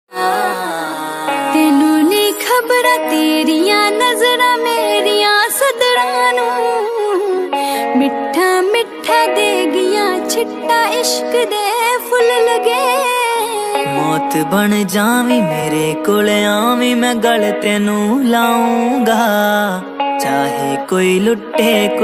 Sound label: female punjabi ringtone